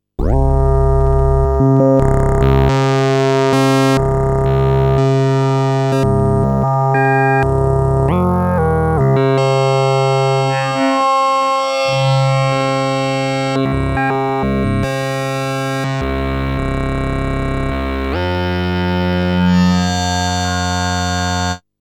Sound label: Sub Oct Options